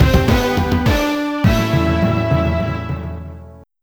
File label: All Stages Clear; Burning Fight; Neo Geo CD